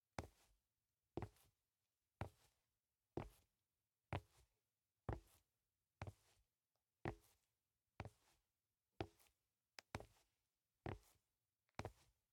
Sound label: Footsteps; LOOPERS; Tyler